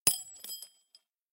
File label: shells 20mm ground